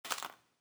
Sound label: falling; marie eve levasseur